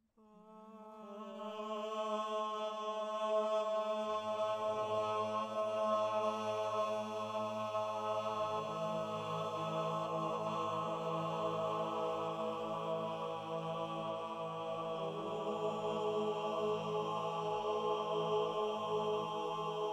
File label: stomp aaaooo; SM Mono